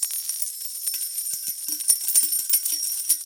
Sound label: medium win loop; eggomatic